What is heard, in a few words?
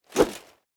minion swing; smite; npc; roman archer